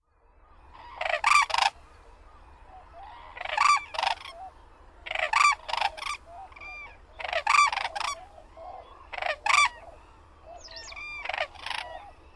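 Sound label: White-naped Crane; manazuru; koe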